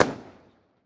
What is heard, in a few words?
firework pop; casualtiesunknown